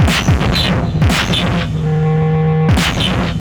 WAR FX

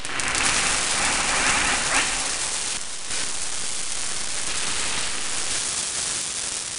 loop, techno atmos

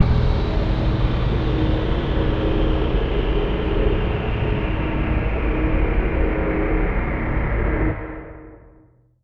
Shocker Sting; musicradar

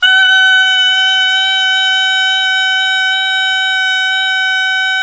BI SUA F#5, BISUA REED; Spectrasonics; Heart of Asia

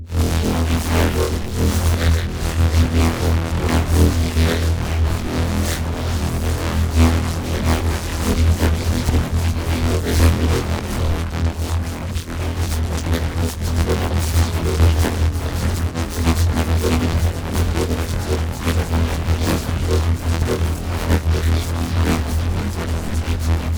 Bass Granulize E

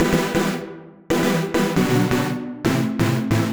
136bpm Lines n Loops Synths, uk garage samples

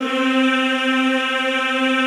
Classical Choir, C3; Best Service; Extended Classical Choir

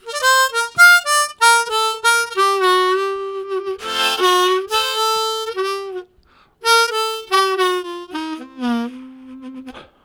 Deep With Chord, Harmonica Riffs; Zero G

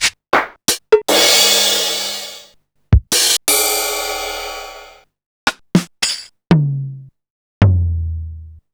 Sequential Circuits Drumtraks

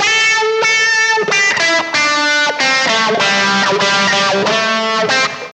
GTR; Phat & Phunky; East West

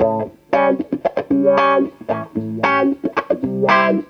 GTR, Roots Disco; USB Soundscan vol.32; AKAI

